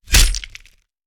Stab Bayonnet; Sound Ideas; Guns

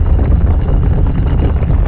Scrape Heavy Stone Loop; Doors

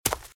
Footsteps Leaves Sprint Right; RadwinIsland

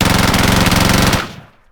Gun, Machine, Minigun, Long Burst; gun and weapon sounds; captain audio; fully loaded

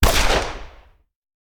Hand Gun; Sound Ideas; Guns

